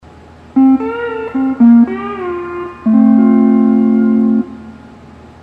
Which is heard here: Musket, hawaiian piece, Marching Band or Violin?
hawaiian piece